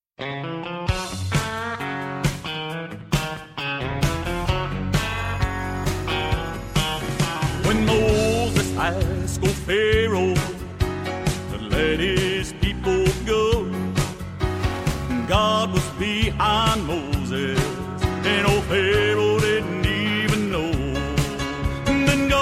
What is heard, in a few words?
one voice